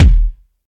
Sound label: small reverb steel kick drum sound D# key, Royality free kick drum single hit